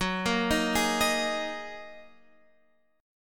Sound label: Gb 6th Add 9th Gb down Guitar Half Step Down; Gb6add9 arpeggiated